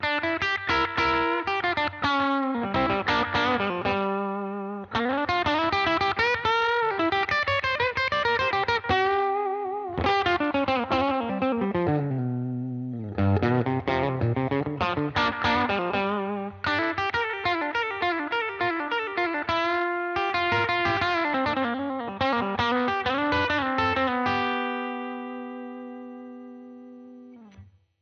Clean Bridge; Apogée kit micros HepCat PAF position chevalet; berg guitares

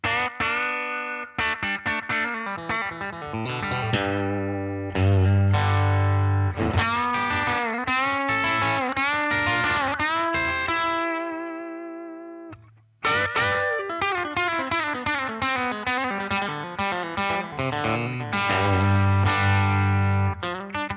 Clean Bridge; Égérie kit micros HepCat Broadcaster position chevalet

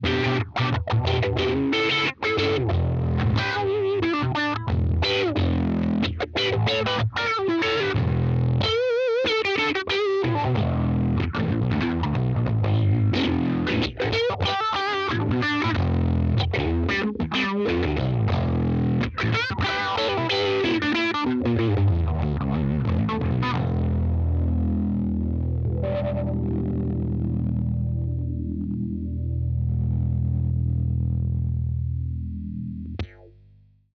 Sat Filter Phasing, guitares

